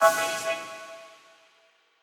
Quasar Clear B

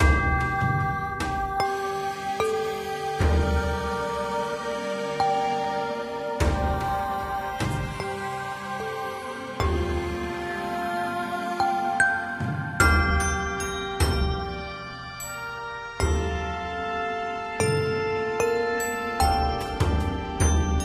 Character Summoning; Reverse 1999

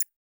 Perfect Clean App Button Click

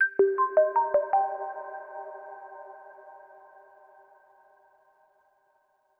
Overlay Tone

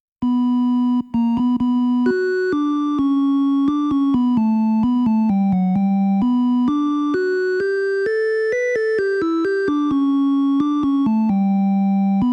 Requiem - organ; Pizzicato Polka

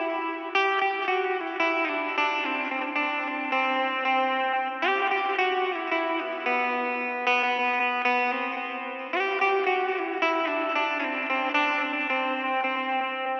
Guitar theme; raji an ancient epic

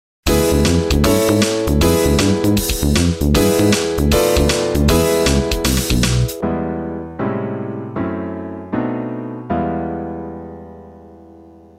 All Stage Clear!

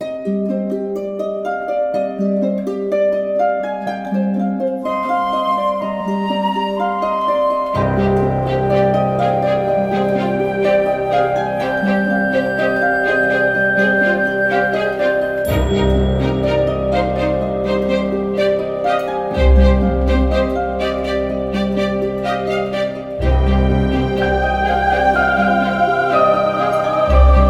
Fog Forest

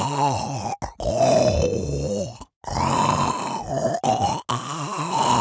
zombie groan singular loop

